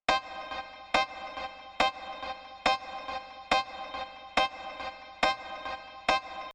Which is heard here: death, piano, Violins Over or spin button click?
piano